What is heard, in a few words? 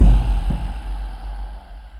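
Music Shield; from dust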